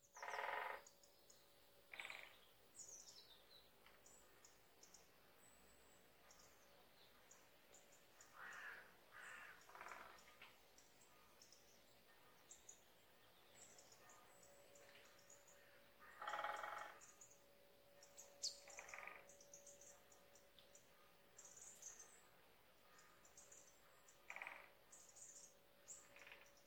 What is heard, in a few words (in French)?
tambourinages pics epeiches